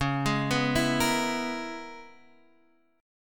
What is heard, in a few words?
C sharp Minor 13th; arpeggiated; chord; x,4,6,4,5,6; JGuitar